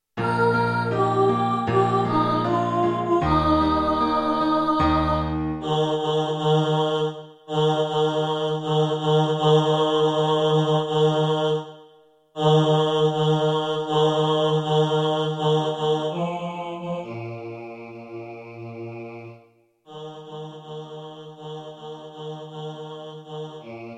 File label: bass